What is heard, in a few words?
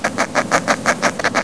anhinga sound; Everglades